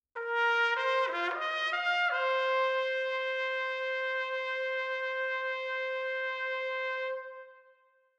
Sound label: Solo Trumpet Stinger